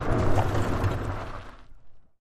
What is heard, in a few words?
Car Tires Dirt Skid; tires on dirt